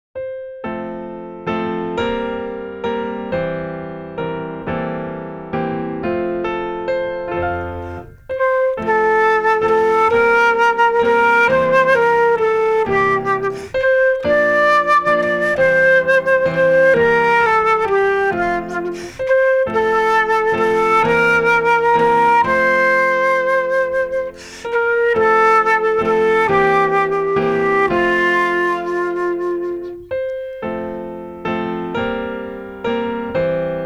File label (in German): Kleiner Walzer